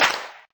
Sex Smack; Lewd SFX

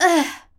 Grunt; Lewd SFX

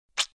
Suck; Lewd SFX

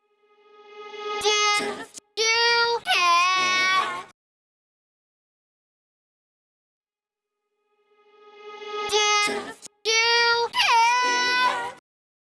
(Dry Acapella); Sisterhooves

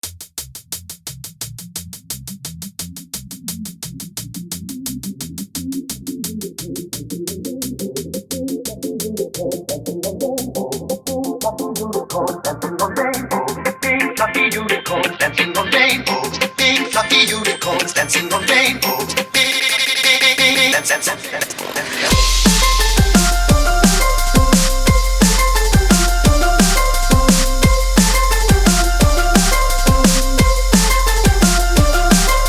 Drum N Bass Remix

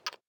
Suck

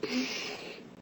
Breath; Lewd SFX

